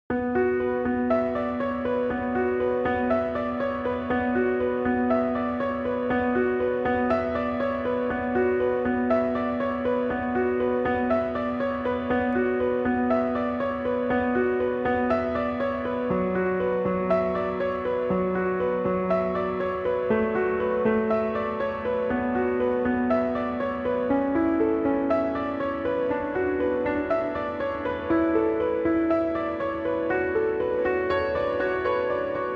Piano Only

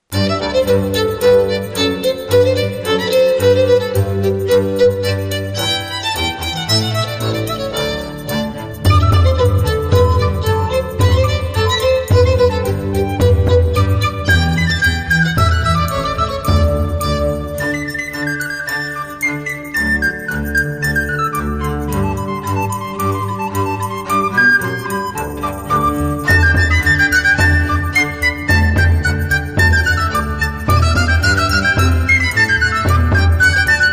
Le Perroquet Tambourine

